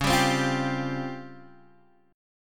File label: Db Major 7th Flat 5th, Guitar Open G